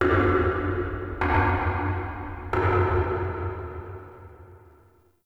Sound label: Knocks Small; Warframe; Ambience; Entrati